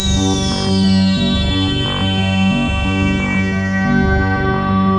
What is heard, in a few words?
JARRE; East West; Global Sounds; D50 FXS